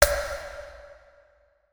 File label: hard tap; SFX